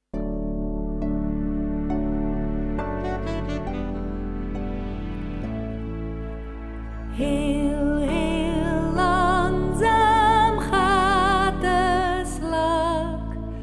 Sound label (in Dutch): 8 verschillende sisritmen